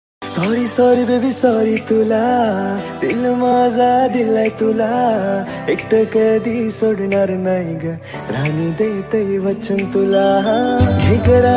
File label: Marathi Ringtone